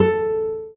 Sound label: Classic Guitar, notifications; Oppo Find X5